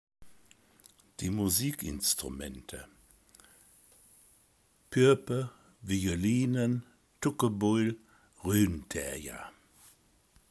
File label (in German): musik